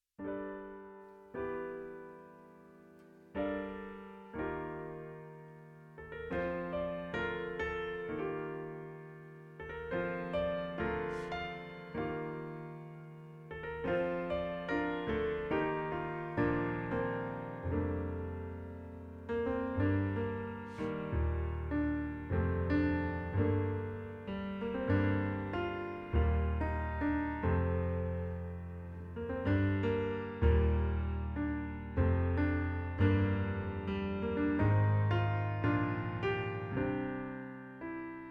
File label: Opening Instrumental